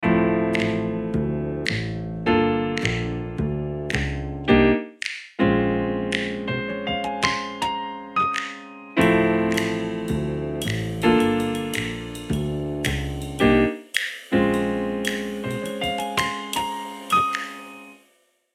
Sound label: coffee ringtone